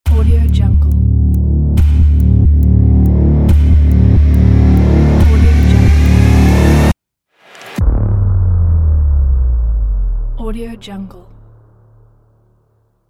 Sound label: countdown teaser logo